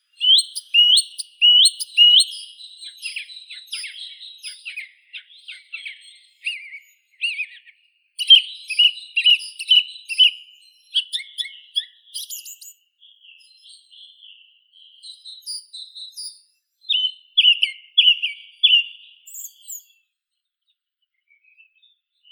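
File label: song thrush, turdus philomelos; drozd pevchii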